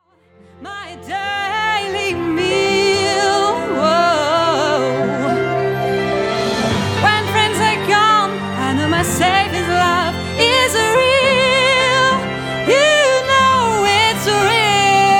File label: modern chart